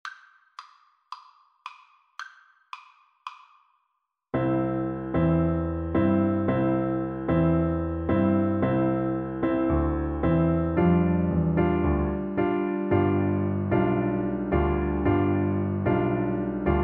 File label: la cucaracha XYL; Slow Playalong; Mexico; Percussion